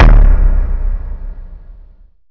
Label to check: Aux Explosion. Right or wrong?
Right